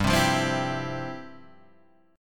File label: F Major 7th Suspended 2nd